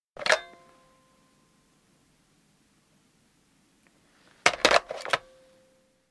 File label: Telephone, handset lifted & replaced (Push button telephone); Telephones; BBC